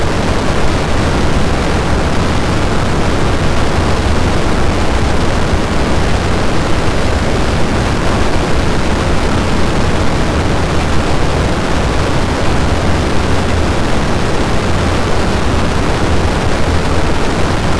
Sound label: static, frequency static sound effects